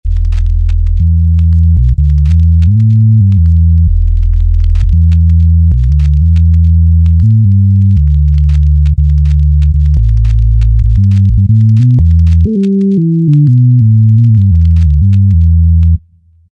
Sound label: Vinyl Rap Bass, Korg TR-Rack